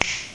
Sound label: Snap Fingers; iPoker 2000